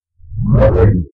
time start or stop; FXHome

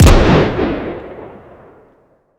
explosion mortar; Detonation; BluezoneCorp

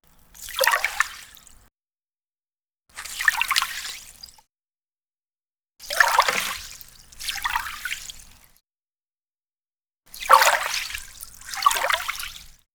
Water ice movement slosh; SkewSound; Frostbite SFX Library

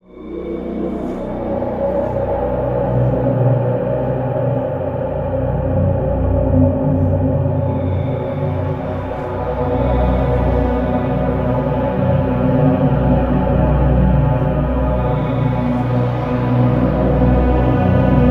heaven synth atmosphere; Ethereal Ambient Samples; Bluezone

